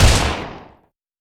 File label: rcp90 fire, weapons